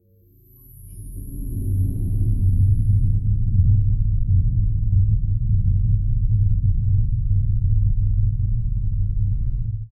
colossal plasma cannon ch

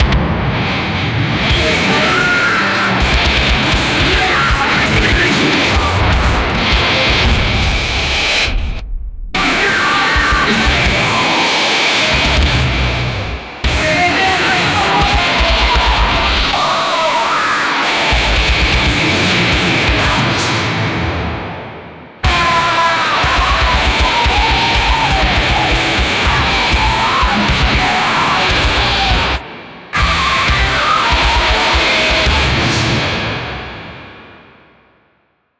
heavy metal track

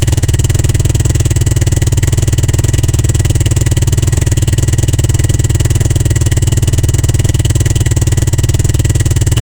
sport motorcycle engine

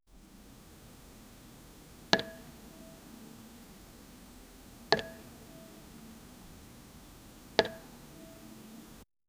a short satisfying sound, board game; sound effect

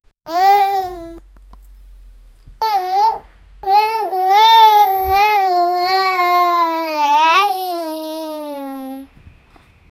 a baby girl driving a

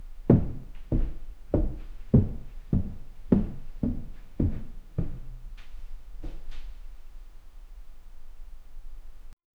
distant wooden floor creak

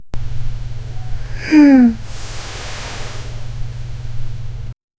failed yoga mat rustle; 4failed yogamat rustle

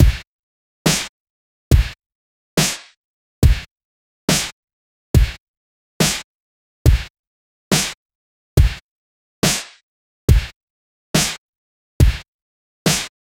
drum suspence count

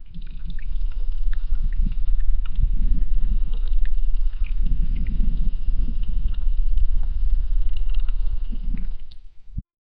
a dark humid swamp ambien

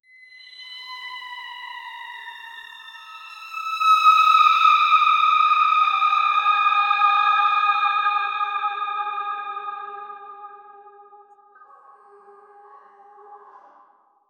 halloween creepy sound

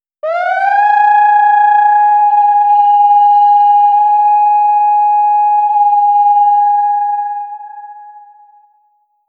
sound effect, historical

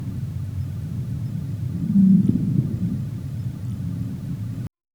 ambient pads shift to a; eerie

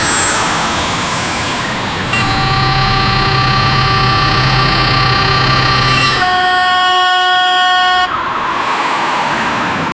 cartoon traffic jam sound

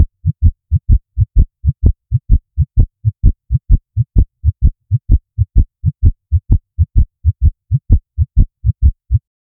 loopable racing heartbeat; high volume

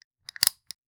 manual plastic lever pull

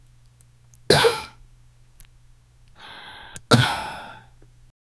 short shaky breath, throa

